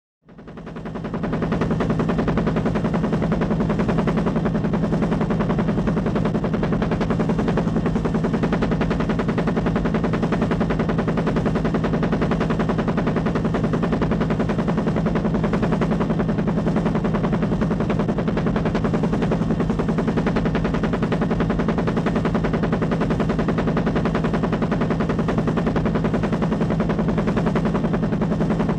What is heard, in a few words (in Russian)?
Двигатель вертолета